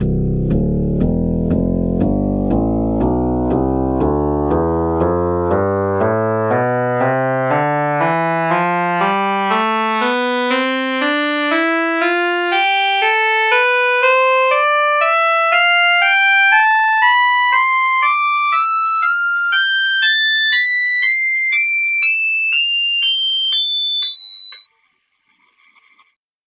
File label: soprano sax tonleiter